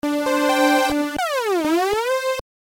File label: Electro Saw Synth